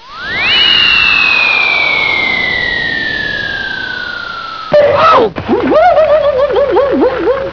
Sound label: rocket